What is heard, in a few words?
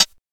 Clean High-Hat D Key, closed high hat sound tuned to the D note; drum single hits one shots; hat samples